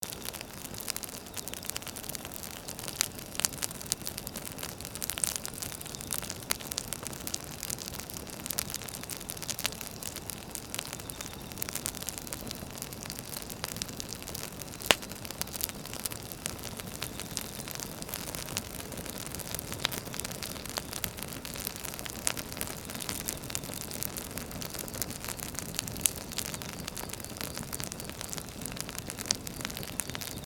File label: fire